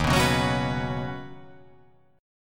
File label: Eb Minor 7th Sharp 5th, down; strummed; chord; JGuitar